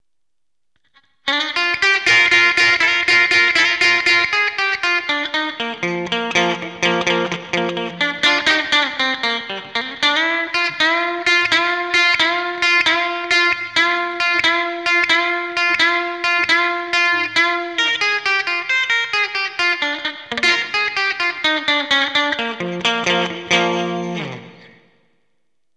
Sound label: amp twin reverb